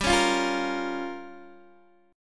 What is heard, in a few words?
G Minor 7th Flat 5th, Guitar Open D